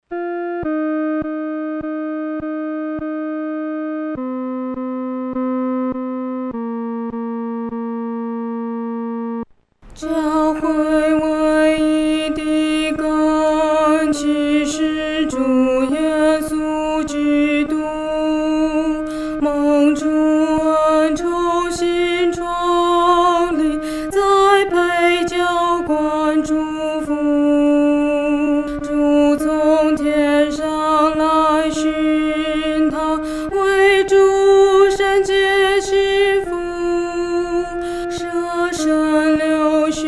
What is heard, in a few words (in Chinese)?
独唱（第二声）; 教会维一的根基